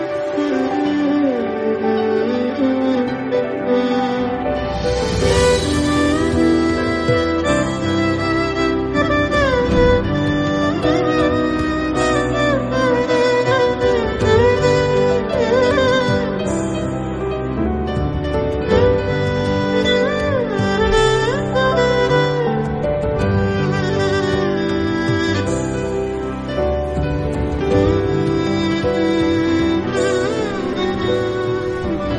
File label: love bgm